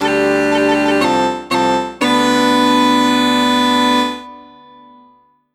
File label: fanfare; ashes of the night